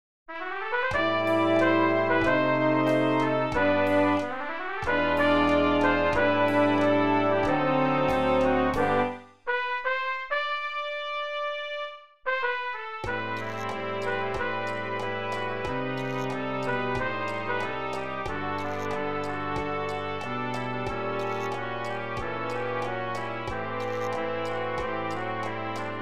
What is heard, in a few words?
(Quintet Brass)